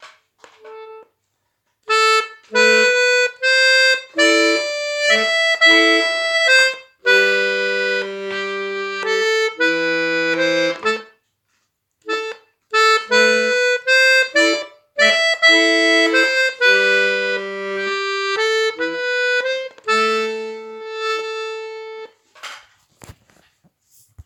Ton Double avec basses; Fisel

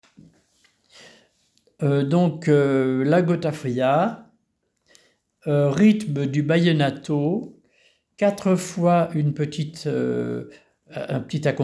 Rythme Vallenato

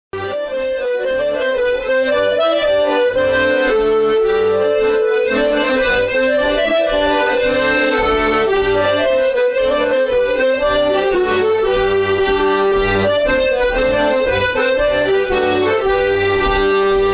Gavotte; Gavotte Pourlet

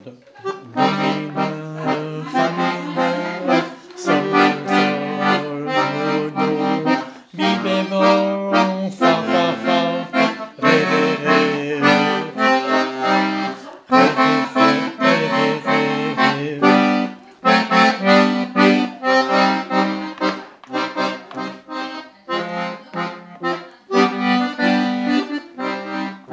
Accords

